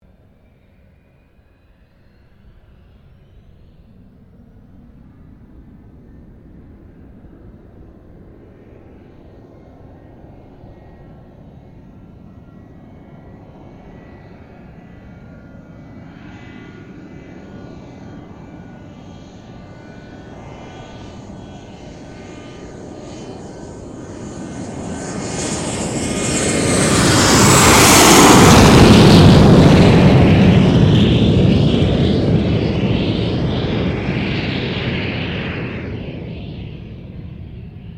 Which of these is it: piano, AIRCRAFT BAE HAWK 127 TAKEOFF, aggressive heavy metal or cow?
AIRCRAFT BAE HAWK 127 TAKEOFF